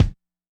kick; pasific; Pop

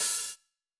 90s hiphop open; Reference beat bpm90

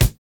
kick, house

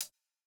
Jrock hihat

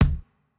Jrock kick

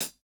hihat, house

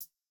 hihat; Reference Beat BPM120; j city pop 1980

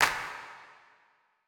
clap; Reference Beat BPM-130; Pophits

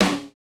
snare, snare house

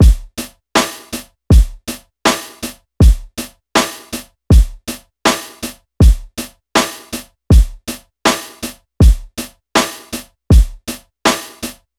Hiphop loop

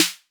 trap snare; Hiphop

